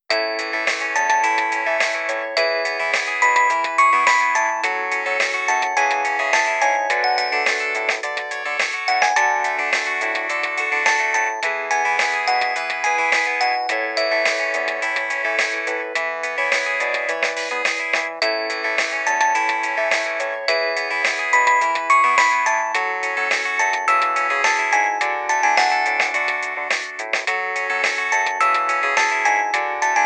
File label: Ring Tone; Tapestry You Will Meet Your Self